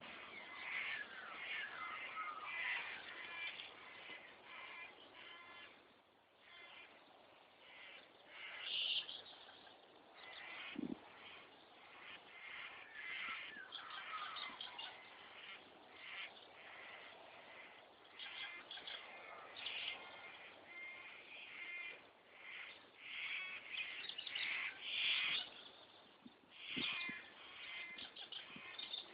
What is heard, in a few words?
birds singing and kookaburras have last laugh